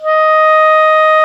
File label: Clarinet